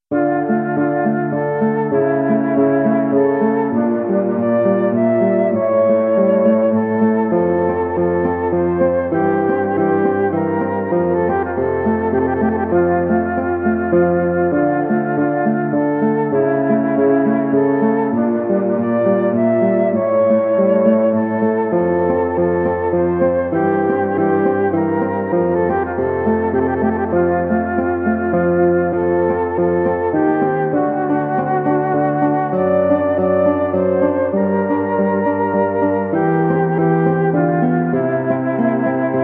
menuet

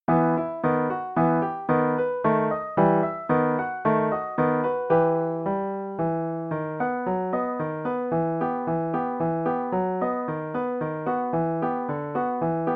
menuet mi mineur

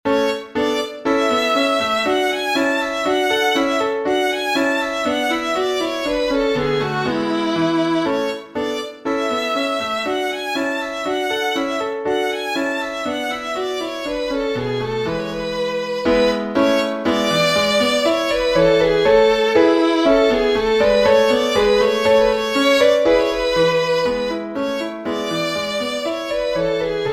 gavotte allemande; chn deville nicolas

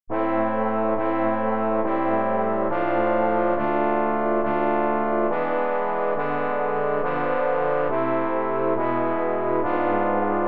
quatuor pour trombone

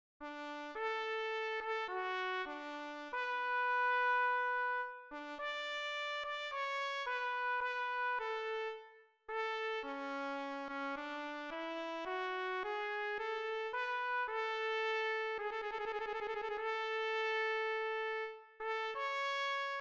hymne national danemark; kra yer hans ernst

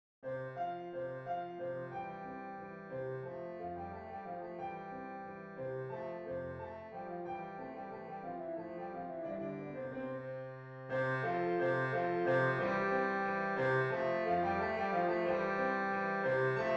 gavotte majeur; Arcangelo Corelli

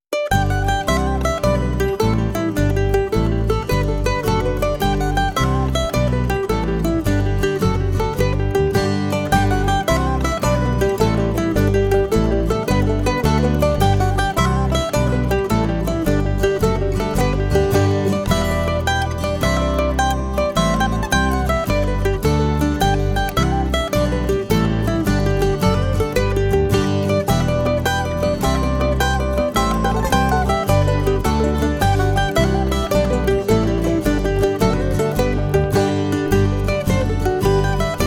medley irlandais; boys bock hills; come raffle; irish washerwoman

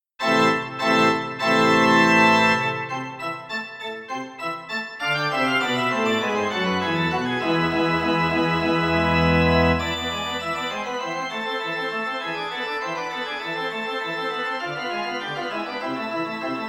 grand jeu form hymne mineur; benaut josse frann ois joseph

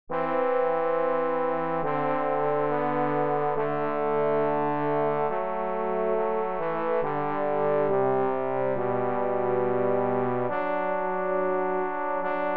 duo pour trombones avec accompagnement

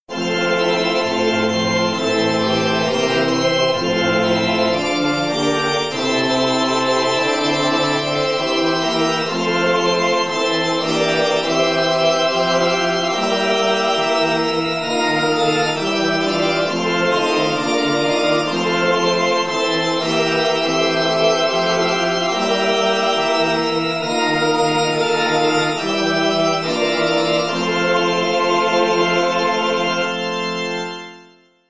choral; agneau dieu

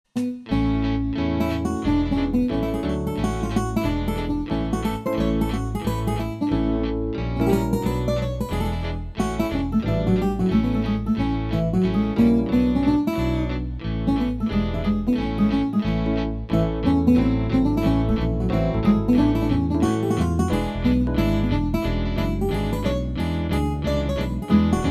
charme du jazz

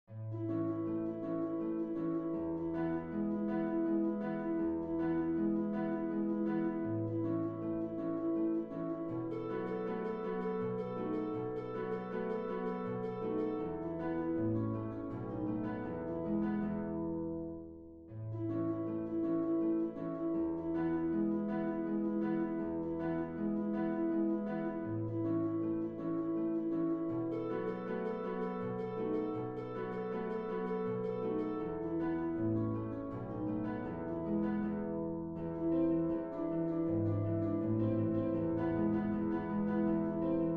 menuet quintette cordes; boccherini luigi

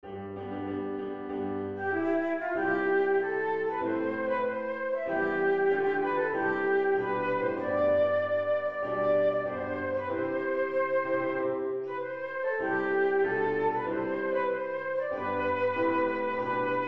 hymne national de Belgique

